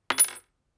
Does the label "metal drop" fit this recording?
Yes